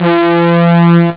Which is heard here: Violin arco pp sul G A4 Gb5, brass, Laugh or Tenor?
brass